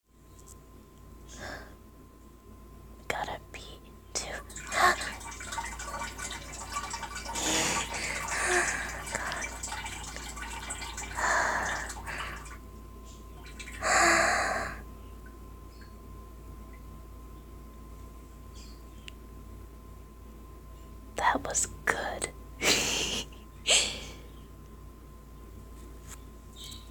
Using the Bathroom (Pee version) [very short][peeing][whispers]; watersports; F4A